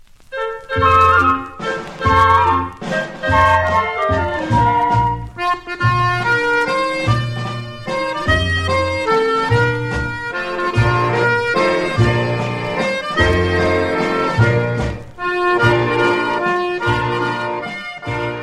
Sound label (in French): Jan Pierewiet, Pays-Bas